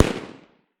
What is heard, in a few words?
Gunshot High